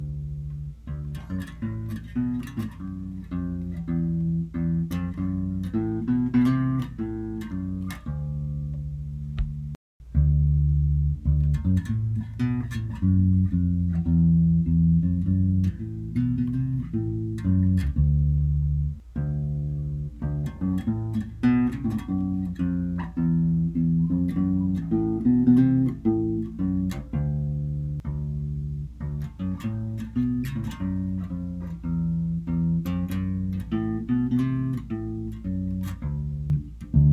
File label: Bass EQ Variations